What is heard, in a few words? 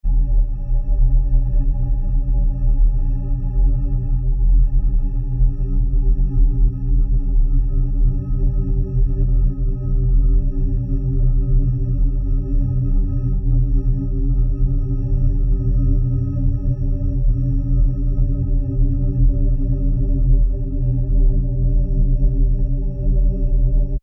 sound design drone dark; Sound Design - Dark Eerie Drone; Drones; McKinney Sound